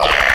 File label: roll; Kitties